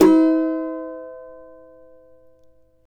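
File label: C# major, Guitars; Spectrasonics; Hans Zimmer Guitars VOL-2; CAVA CHORDS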